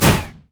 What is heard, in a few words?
lightsaber missile; riven